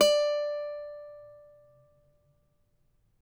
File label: CAVAQUINHO D, Guitars